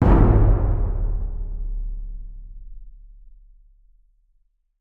mind jumpscare; Barotrauma; Barotraumatic; Cthulhu

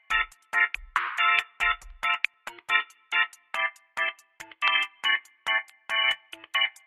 Elevator Music; Barotrauma; DynamicEuropa

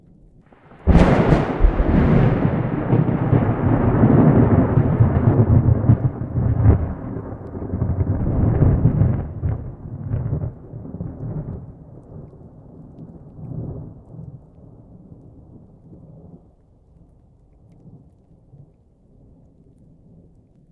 Piezo crystal far; Barotraumatic

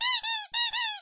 squeak; Barotrauma; Neurotrauma

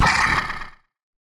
damage; Barotraumatic; Crook

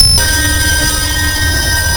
sonar Ping Water Very Close Beacon; Barotrauma; Real Sonar

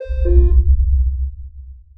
sonar Ping Air Close Beacon Directional; Barotrauma; Real Sonar

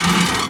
metal run, Footsteps; Thalassophobia Restored

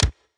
Popup Menu; The Avali's Audio Overhaul

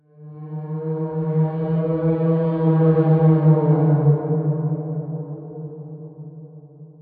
Ice Whale damage; Barotraumatic

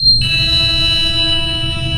sonar Ping Water Medium Beacon; Barotrauma; Real Sonar

